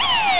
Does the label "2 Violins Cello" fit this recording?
No